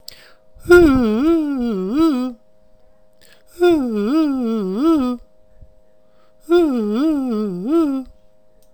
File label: mystery song